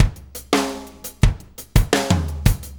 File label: Drum Tools, Loops